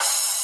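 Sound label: drum crash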